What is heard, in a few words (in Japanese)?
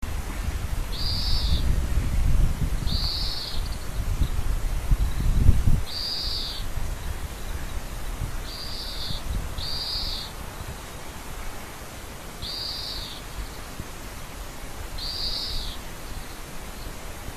kawarahiwa, bird; 白鳥社下参道の杉梢で、 ビーの鳴き声; 蘭梅山; 野鳥